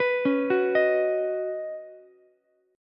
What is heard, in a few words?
C sharp 9th Suspended 4th C sharp down Ukulele C6, chord; C#9sus4 arpeggiated; JGuitar